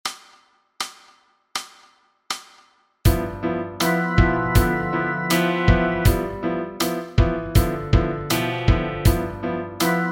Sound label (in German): Blues In G ohne Bass